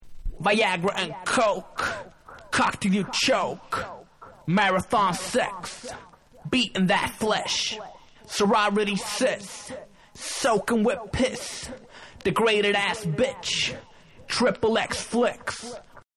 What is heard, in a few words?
Acappella